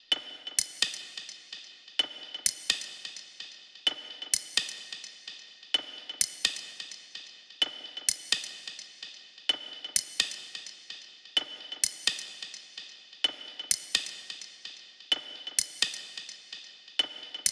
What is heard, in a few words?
perc 128; Drum Sample Pack; Loops; SD SEISMIC; Slate